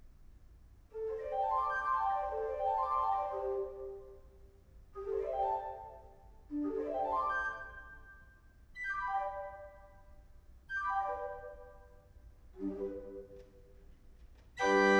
Organ Test Shure SM27 A-B Spaced Pair (Organ Mics); Pioneer Memorial Church; Kenneth Logan